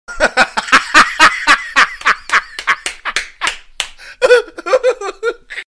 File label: sound rire